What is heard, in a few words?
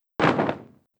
Bullet; Eft; FOGBRIDE